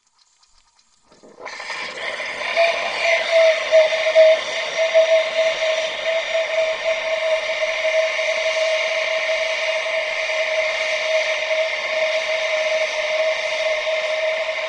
Steam Engine Model - Engine - Whistle Short - Pitched Down 3 Octaves; Pole Position